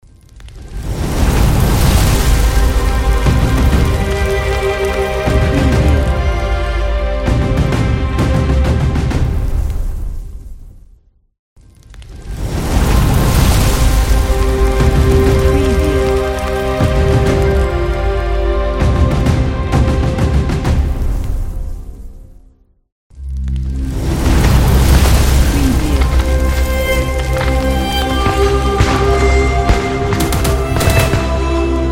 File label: war logo